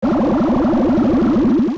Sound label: filtered bubble up down; mario; sound galaxy vr universal fx; nova sound